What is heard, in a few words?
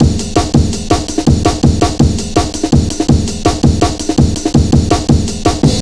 165, Drum Loops, Drum & Bass